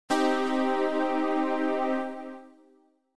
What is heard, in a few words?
Chord